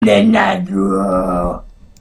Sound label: raaaaaaw